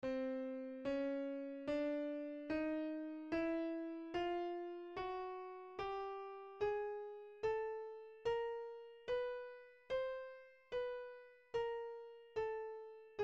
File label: chromatic scale